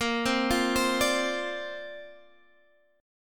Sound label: C 7th Suspended 2nd Suspended 4th A sharp